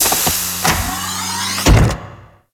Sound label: Ship Supply Socket Open; Gameplay; Ambience; Warframe; Corpus Ship Remaster